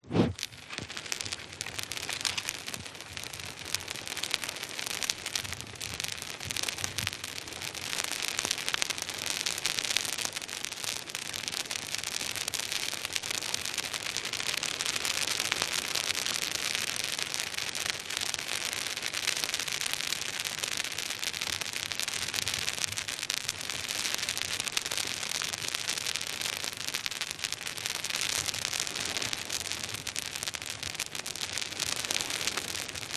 Fire; audio hero

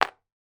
game metal playing piece drop onto playing board; monopoly

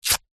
foley clothes running shorts polyester rip

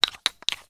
sport handling wooden handled skipping rope

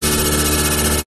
science fiction door open electronic

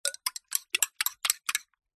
spoon stir hot water tea or coffee in mug

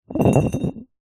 carboy demijohn roll hard ground; zapsplat

